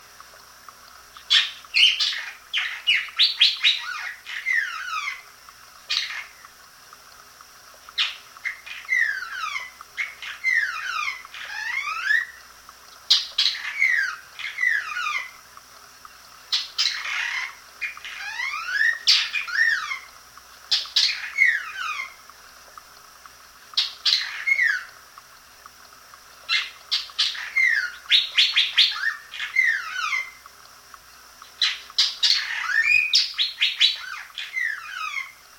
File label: martin imitating cardinal